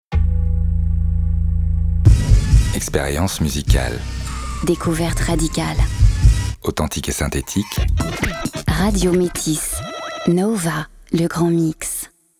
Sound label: Radio Nova Jingle; Nova 25 ans - 2003